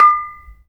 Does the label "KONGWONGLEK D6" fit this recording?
Yes